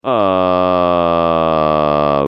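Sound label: pyro pain critical death; johnmadden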